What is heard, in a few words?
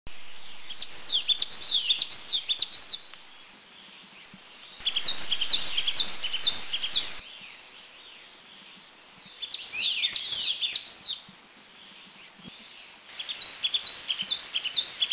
Taiwan Tit Blue Gate; Yellow Tit Macholophus holsti Near-threatened (NT) Country endemic